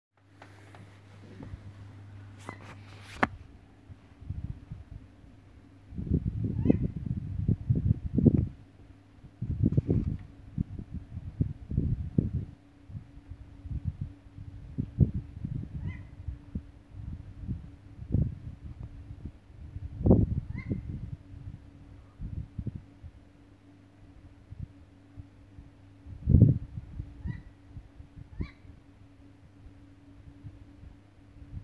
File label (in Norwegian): merkelig fuglelyd